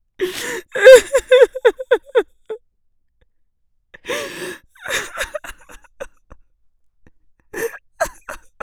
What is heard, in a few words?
crying